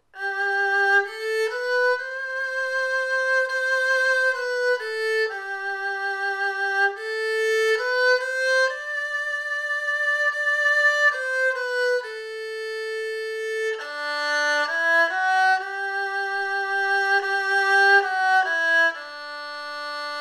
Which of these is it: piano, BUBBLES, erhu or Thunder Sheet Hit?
erhu